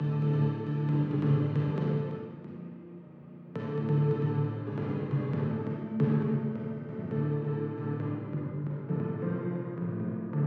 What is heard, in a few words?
bass reverb